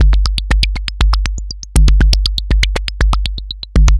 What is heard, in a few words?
Anabeat Mix, Beats